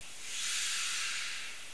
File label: directair, Train; APT; OpenBVE